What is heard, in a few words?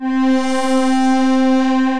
Bright Pad, Wavetable, Synth Pad; Poseidon